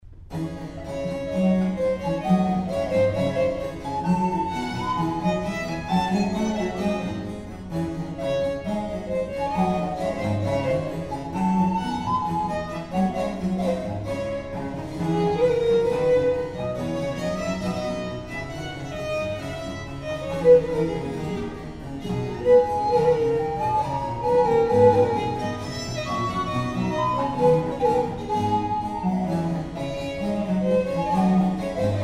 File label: Trio d-moll Allegro; Telemann